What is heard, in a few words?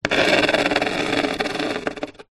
plastic item scrape concrete; zapsplat; foley